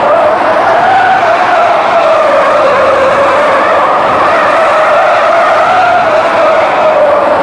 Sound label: skid dry; Kart